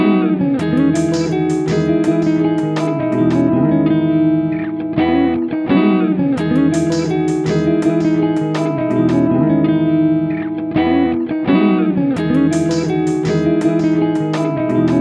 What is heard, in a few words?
music closing